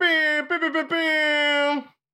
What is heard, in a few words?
Airhorn; Foley Library; Let's Learn Everything!